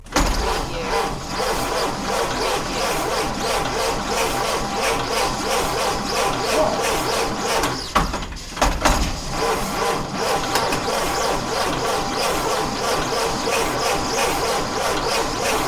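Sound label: Model T Ignition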